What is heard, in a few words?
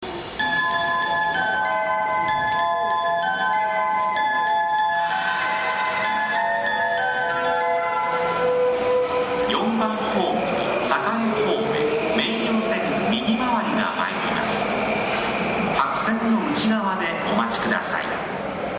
sekkin; kanayama